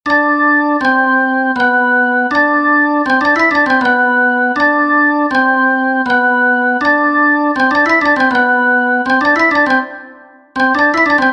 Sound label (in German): quintole; dommer 1865